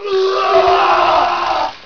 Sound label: AAH; Spraak